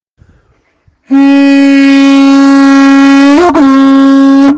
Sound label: Blowing horn with brass mouth piece